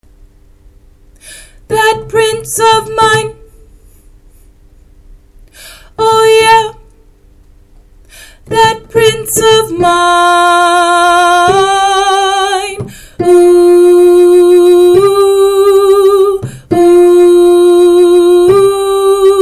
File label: soprano